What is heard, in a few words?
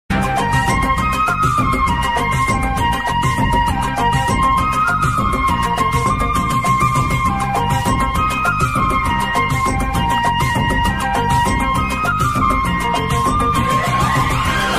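flute ringtone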